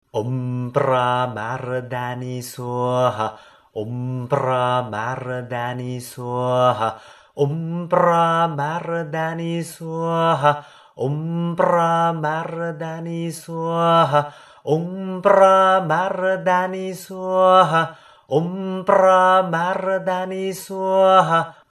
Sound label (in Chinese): 地藏滅定業真言〔唱〕; 地藏王菩薩 Kṣitigarbha; 古梵音暨楞嚴學推廣講師唱誦版